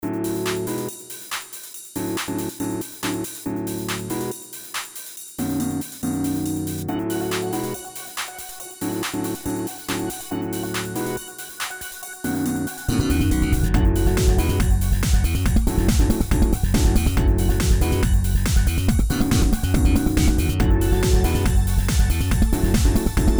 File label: Racing Menu